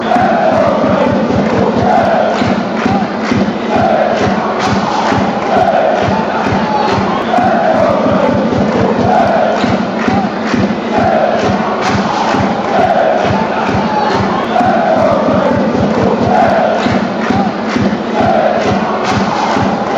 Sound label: hey hey who not jumps fan chants